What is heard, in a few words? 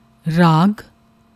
raag; shabad kirtan presentation